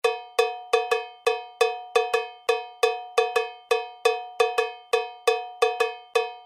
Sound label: cowbell; lateinamerika